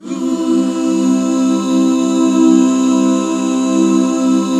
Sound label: C 6, back chords; Sound & Vision - Gigapack II CD 1